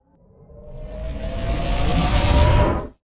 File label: Plasma Arm Charge